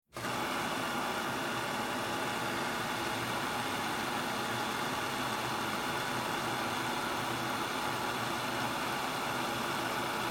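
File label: Water Spray Interior Car Steady Light; Car Wash; Spraying; Objects; Big Room Sound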